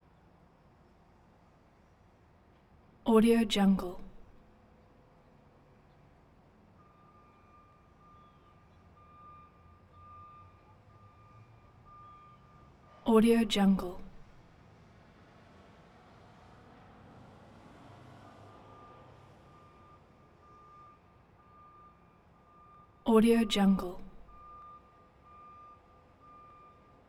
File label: amb int of ext morning construction; industrial sounds; سر و صدای ساخت و ساز